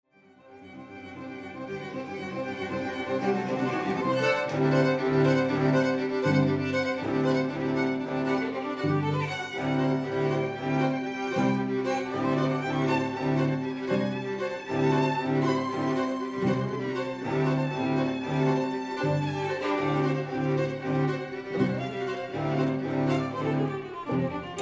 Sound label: string quartet